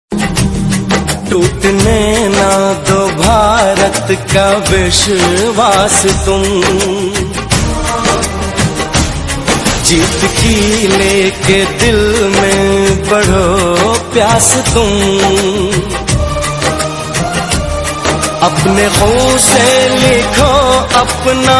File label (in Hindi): desh bhakti ringtone dj